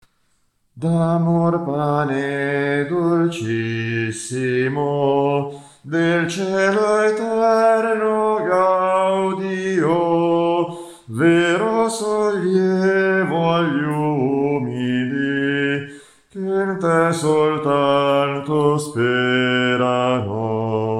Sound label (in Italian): soprani